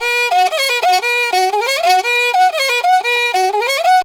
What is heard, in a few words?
riti grooves; Best Service; World Colours